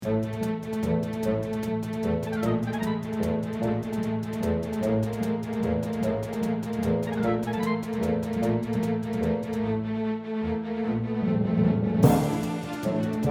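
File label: Action Scene without Dialogue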